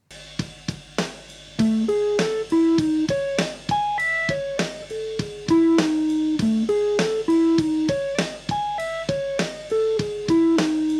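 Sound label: full speed, rock guitar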